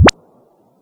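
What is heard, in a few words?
Boss DR-202 percussion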